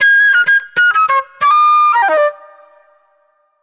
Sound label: NY 70's Jazz Flute; Sonic Foundry (Sony Creative Software); Manhattan Flutes; Session 01 - Benedetta